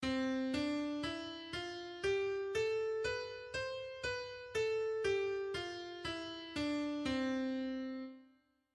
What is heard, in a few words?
c major scale